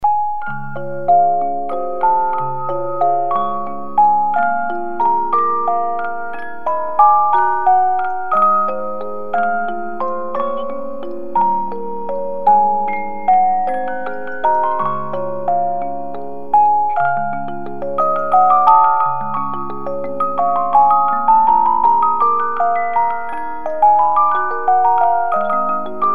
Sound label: Nocturne Op 9 No 2, 50 Note music box movement